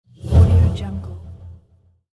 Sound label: whoosh spin; ldj audio